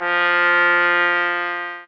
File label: sax